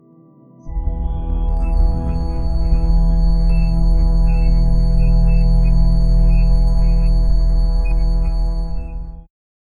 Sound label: a cold ambient soundscape, detached